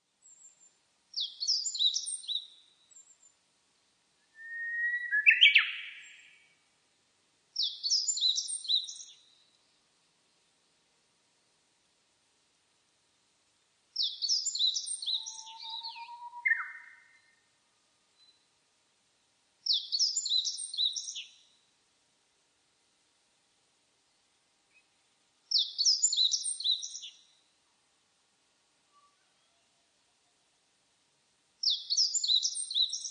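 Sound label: hoojiro, meadow bunting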